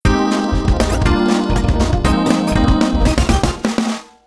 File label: Pop; tsmtoolbox; wsola